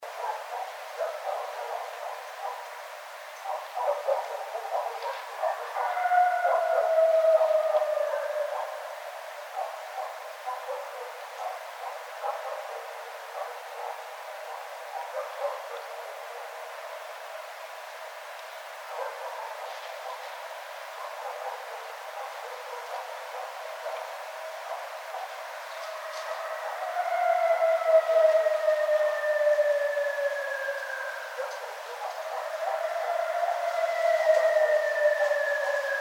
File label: Howl